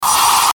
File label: static hiss; Static Hiss Filter Airy; Production Element Imaging Element Accent Transition; Blastwave; Static Sound Effects